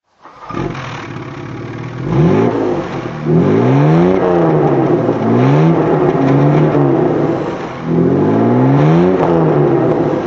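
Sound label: jaguar mk2 manual throttle, engine sound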